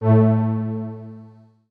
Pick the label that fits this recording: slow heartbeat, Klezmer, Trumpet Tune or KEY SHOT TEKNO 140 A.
KEY SHOT TEKNO 140 A